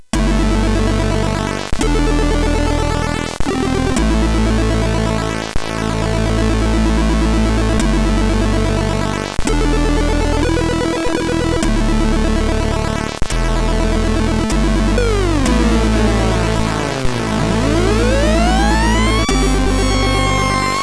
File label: Epick Bit, sid 8580R5